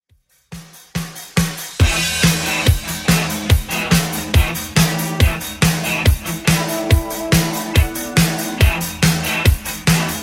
80s 141bpm; Xtend Edit

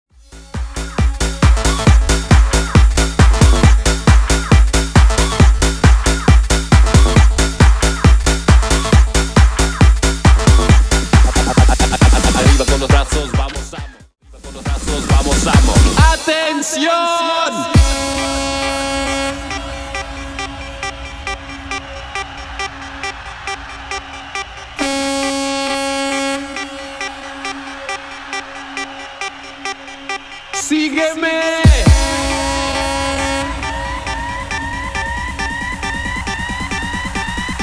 90s 136Bpm; Redrum

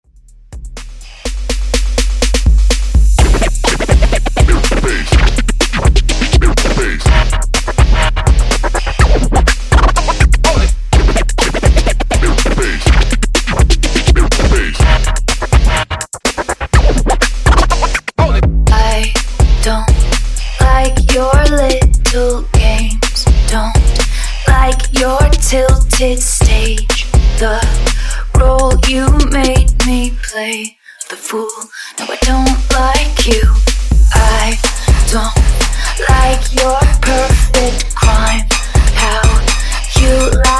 Funk MashUp 124Bpm

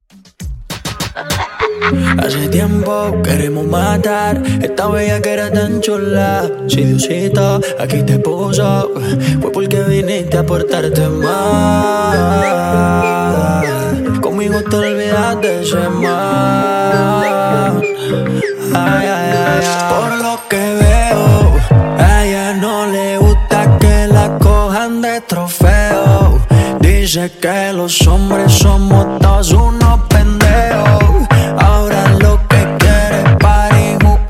Dj Intro Outro Dirty 100Bpm

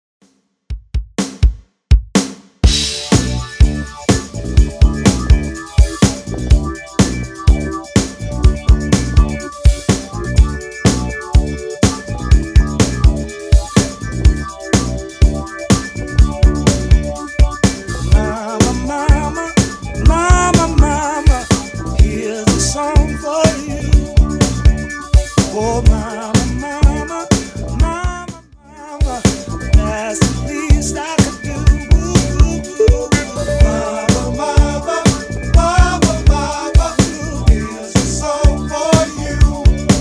70s Real Drum 124bpm

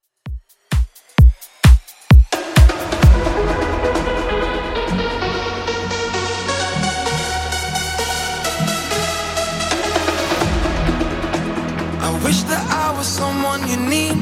Top40 Clubmix 130bpm CLEAN

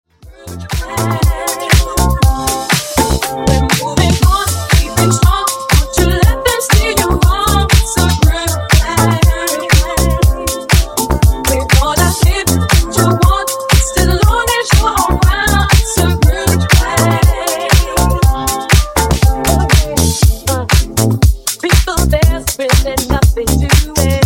Disko Funk Remix 120Bpm

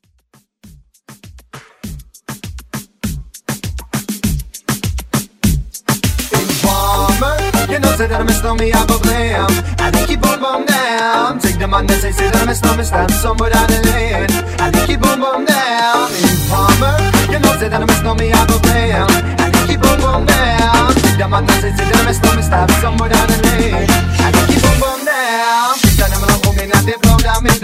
Moombah

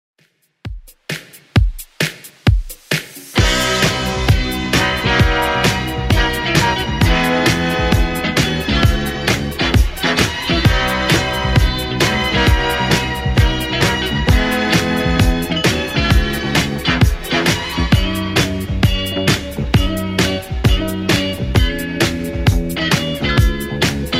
70s Dance Redrum, 132 bpm

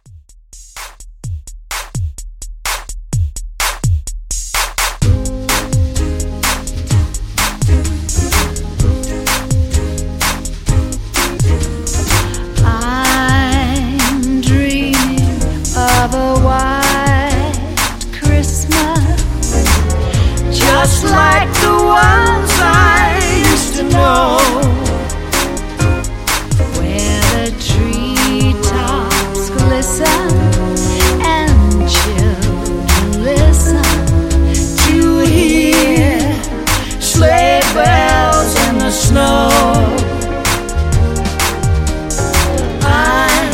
Redrum; Remixfreakz

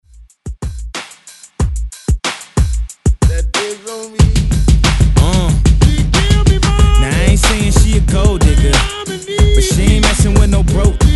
ReDrum 92Bpm; DJDOLLS

